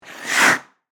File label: Ice Skates Blade, Scrape, Stop; Sports; Ice Skating; BlastWave